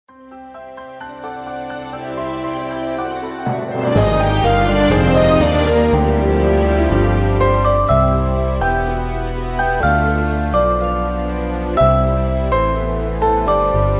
wersja instrumentalna

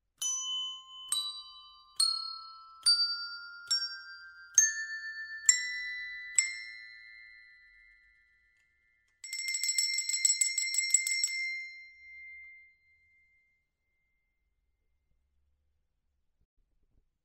dzwonki naciskane

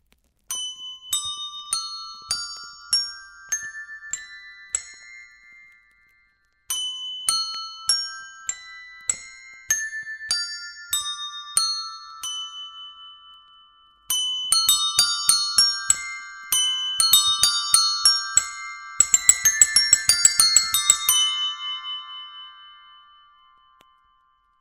dzwonki naciskane bez rączki

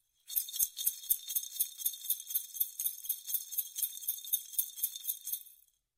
grzechotki

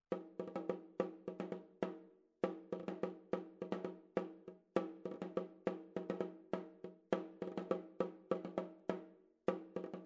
bebenek; teczowy

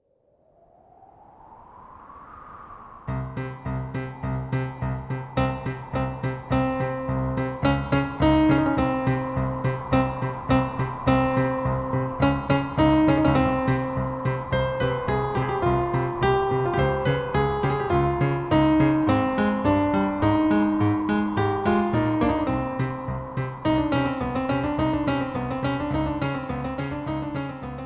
Grozny mroz wersja instrumentalna

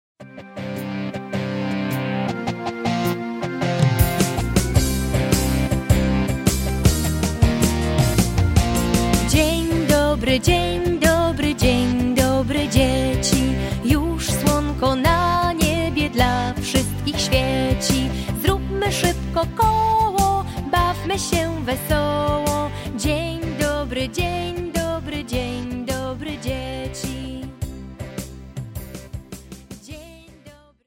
dzien dobry dzieci wok

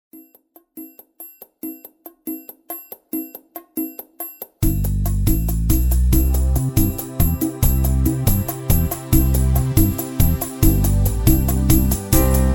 wersja instrumentalna

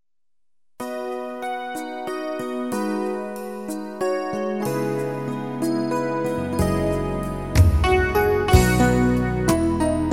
jesienna pogoda instr

